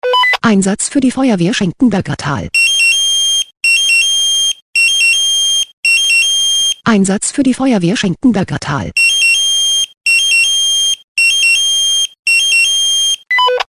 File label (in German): SMS Alarmierungstone; FW Schenkenbergertal